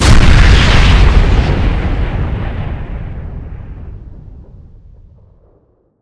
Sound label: Anom Explode